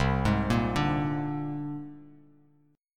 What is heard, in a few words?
C 7th Suspended 2nd Sharp 5th C down Bass Standard; C7sus2#5 arpeggiated; JGuitar